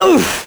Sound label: hurt; Crypt of the NecroDancer